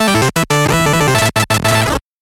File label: 8bit jingle fast